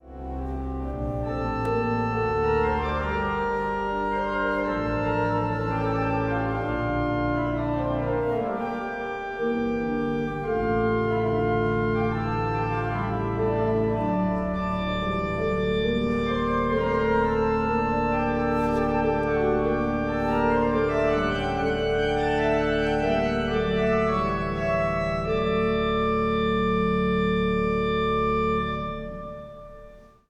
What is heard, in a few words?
Memorial Church Organ Buxtehude